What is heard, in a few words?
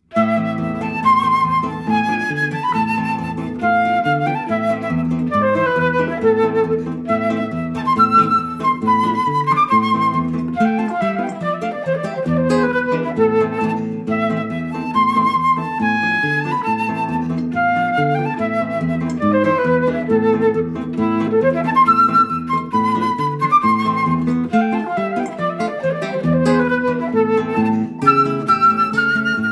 guitar; brahms